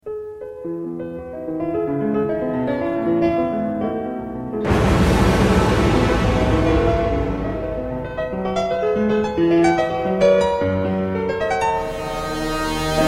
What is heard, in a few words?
sonata for brass; Audio Montage